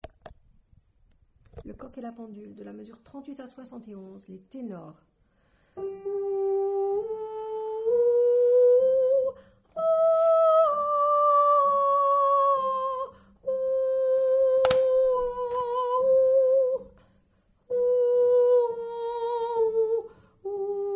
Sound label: Tenor